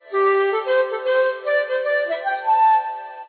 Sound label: instrument shakuhachi